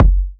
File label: studio kick sound f sharp key; kick drum samples; one shots